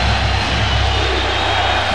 Football Crowd Defense Loop; Crowds

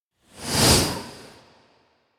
Whoosh Breath By Passing Ghostly; Hollywood Edge; sound designer's toolkit v2

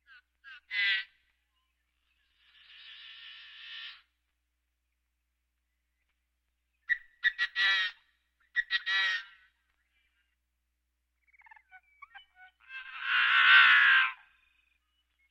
Birds

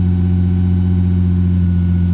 Prowler Loop, Automobiles

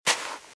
Footsteps - Sand - Dry - Walk - Left; RadwinIsland

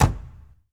Ferrari 355 Door Close; Automobiles

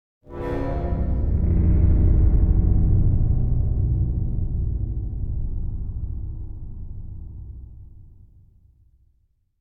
Metallic Groan Low Vibrations Drone; Hollywood Edge; sound designer's toolkit v2

